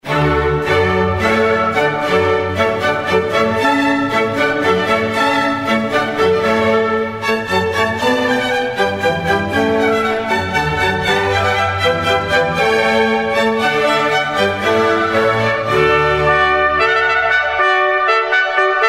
tono water music; handel; 1717

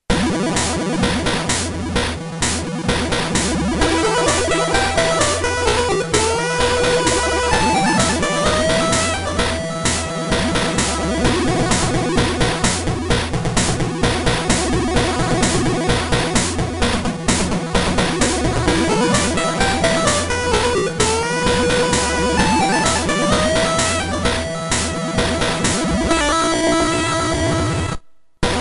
tono game boy; mortal kombat; 1993; acclaim; midway

